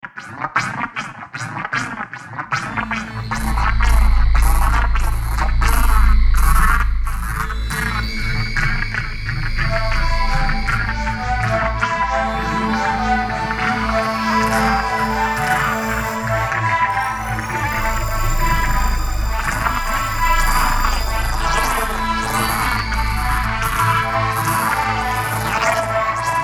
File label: C15 Choirs; Fuxi Choirs; Music and recording by Matthias Fuchs; Visophon